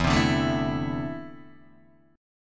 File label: E 7th Suspended 4th Sharp 5th, Guitar, DADGAD